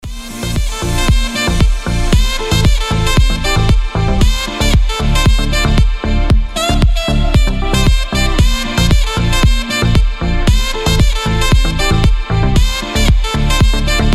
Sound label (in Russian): saksofon